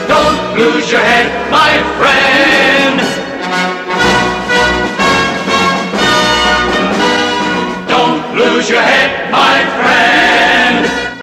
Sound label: Fanfare